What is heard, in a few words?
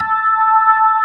mid slow, ToneWheel Organ; E-MU Formula 4000 Series Vol. 3; Analog Odyssey